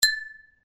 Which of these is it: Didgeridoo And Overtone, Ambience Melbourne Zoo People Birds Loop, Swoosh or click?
click